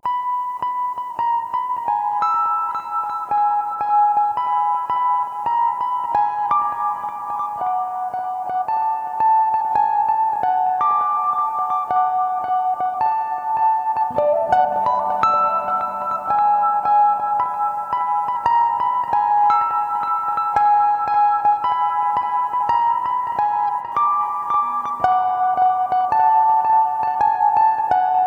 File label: frost intro guitar